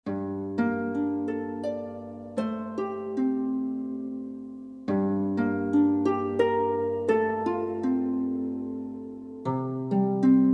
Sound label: Celtic Music